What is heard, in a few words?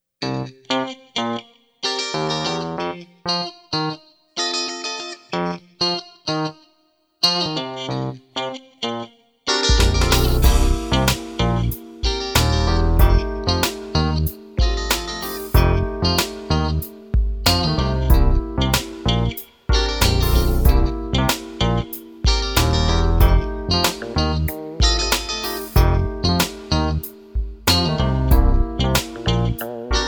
Pattern Inst